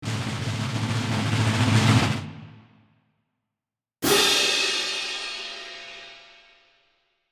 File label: Drum rolls with cymbal crash